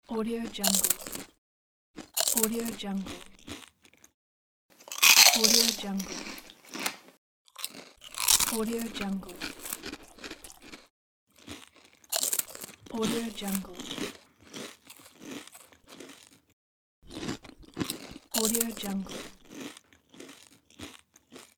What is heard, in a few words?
eat chips